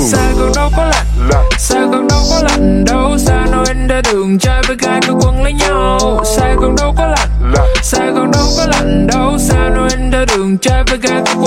Cover Giong Nam

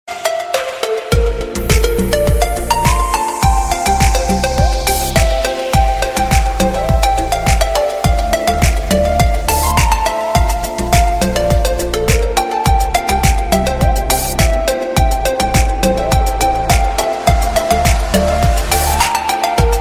nhac chuong iphone 7 moi nhat

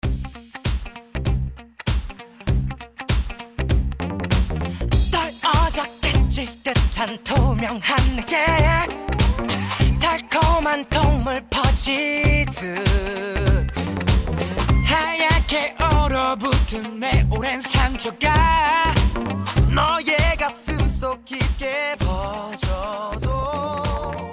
quang cao

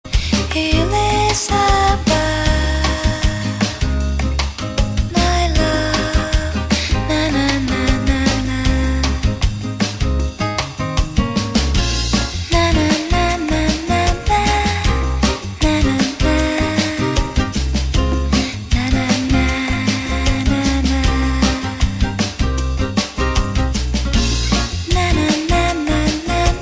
nhac quang cao

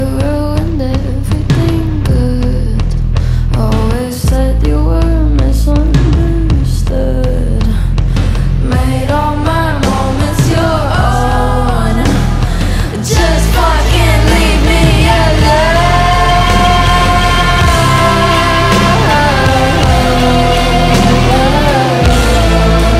nhac chuong

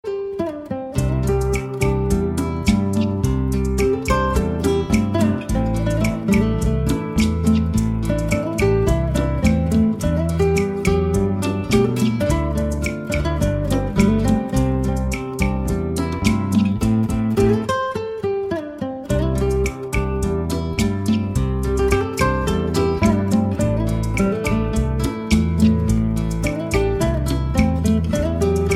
doc tau guitar

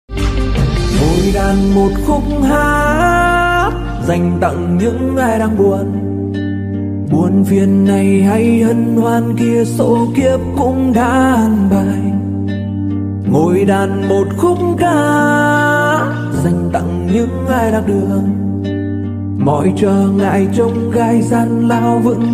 Nhac Chuong

